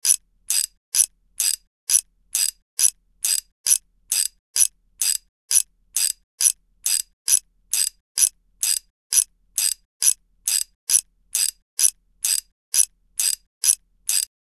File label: Steam engine metallic ticking mechanical loop